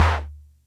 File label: Music Percussion Computer Tom, Drum Machines; Atlantex MPC-1